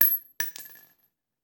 Bullet Shell; Sound Ideas; Guns

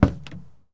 Jeep Wrangler Door Open; Trucks

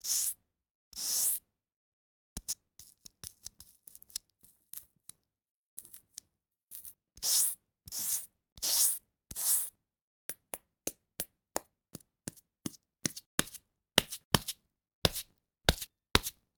styrofoam - scrape, hit, put wholes in; human; NS Studios - ultra sound collection

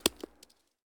Baseball Bounce Dirt

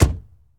Truck Explorer Door Close; Trucks

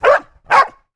Dog Barking; 1001 Sound Effects

